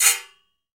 RECO-RECO, Latin Hand Perc; E-MU Producer Series Vol. 2; More Studio Essentials; Composer

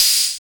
FX ANALOG; Sound & Vision - Gigapack II

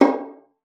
VIOLIN; Xsample VOL-5; 90 s Sample CDs; VIOLIN FING

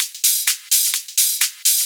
Hihat Loop 128 BPM

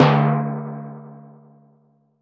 Timpani, Percussion

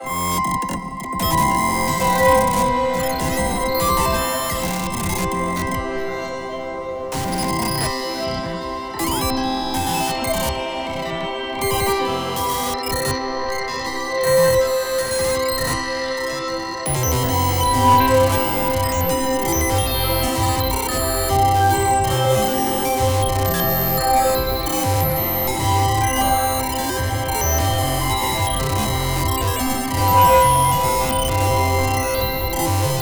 texture harmonic slips

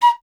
FLT PIC, Piccolo; Miroslav Vitous - Solo Instruments VOL-2

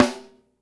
HIT, SNARES; Sampleheads; New York City Drumworks VOL-1

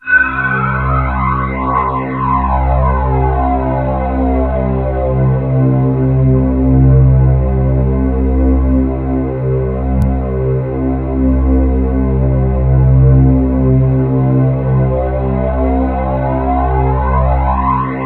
AIR STRG; MORPHEUS

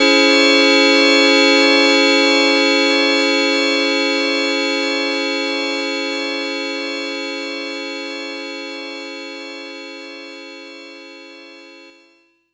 C Diminished 7 one shot, Synths; Sonic Foundry (Sony Creative Software); Electronic Point Blank

